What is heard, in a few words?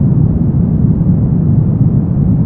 Tarmac Concrete Surface; Totaled; XBOX